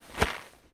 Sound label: heart rest